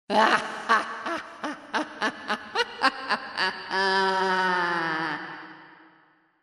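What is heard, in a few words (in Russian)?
смех старой колдуньи; Для Хэллоуина; звук смеха ведьмы; Коварный злой смех старой ведьмы, забавный смех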